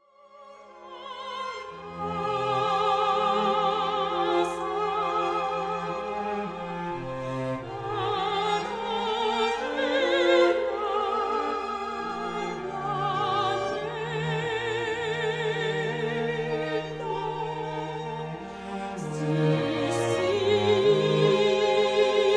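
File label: String Quartet With Soprano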